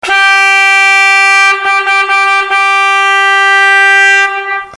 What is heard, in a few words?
horn, whistle